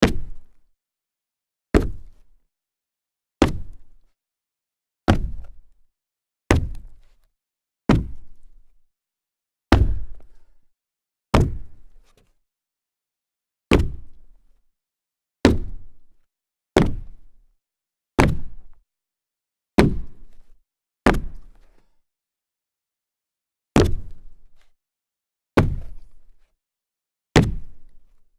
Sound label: sneaker large jumps solid wood